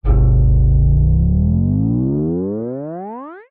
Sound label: cartoon grow taller